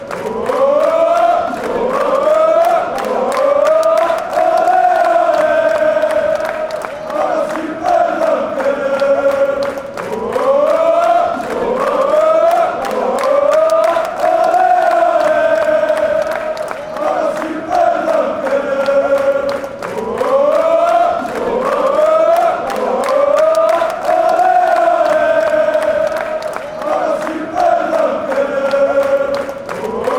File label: ooooh ooooh, chants; lokeren